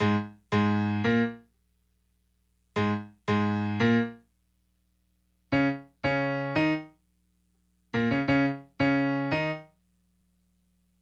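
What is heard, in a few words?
piano, Hip Hop and R&B Drumloops; Best Service ProSamples vol.01; AKAI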